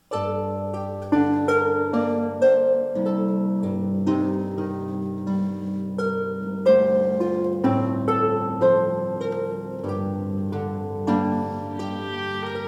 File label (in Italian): Arpa & Violino